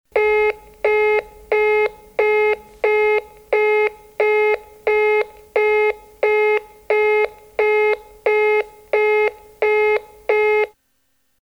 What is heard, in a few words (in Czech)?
ton obsazeneho cisla